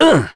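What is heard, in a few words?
Vox Landing, English; Roman; kings raid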